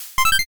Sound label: line clear